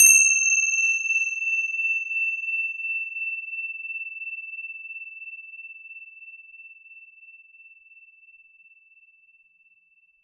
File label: Tibetan, Cymbals; Percussion Samples